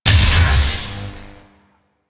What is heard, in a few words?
Orb Zap; Weapons; Warframe; Tenno; Grimoire